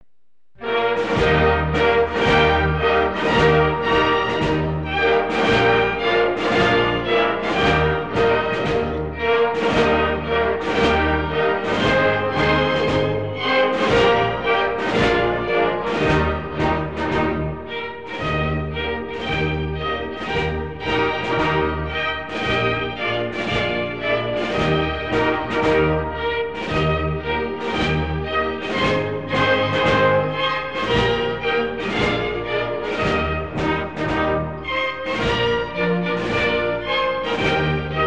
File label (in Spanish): himno nacional